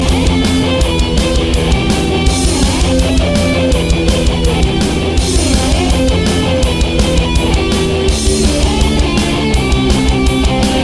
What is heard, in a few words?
Metal; Hedgehog